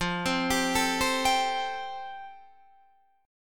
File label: F Major Add 9th, Guitar Open D